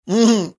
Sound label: cute giggling sound